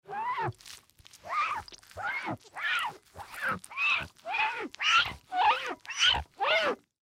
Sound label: Cloth Wet Rag Washing Glass Squeaks Slow; Cleaning; Foley; Blastwave